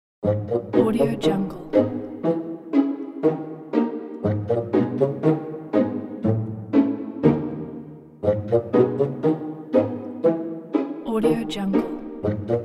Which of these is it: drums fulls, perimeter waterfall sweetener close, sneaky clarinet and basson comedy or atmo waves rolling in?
sneaky clarinet and basson comedy